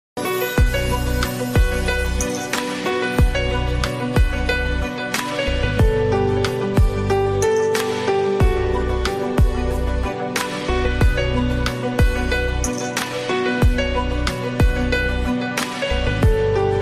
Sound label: instrumental ringtone